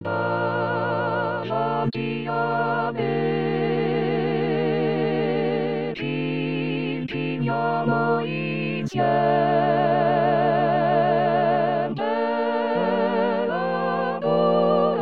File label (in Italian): Davanti Al Re Coro